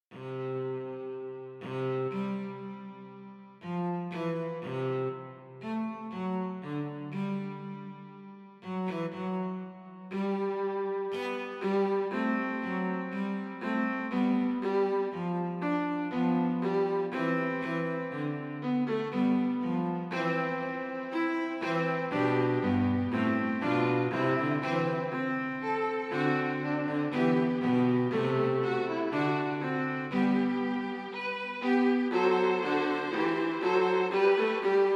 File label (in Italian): Fuga sul tema Kyrie eleison, Christe eleison; Morgenroth Franz